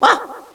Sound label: "wah", Echo inside caves, underground; DS; ML BOWSERS INSIDE; Luigi